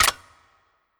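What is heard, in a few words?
Engineer Radiant Drone Click Launch Grenade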